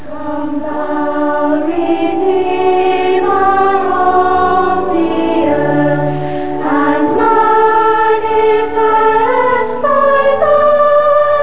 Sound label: choir